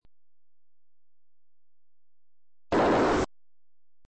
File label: door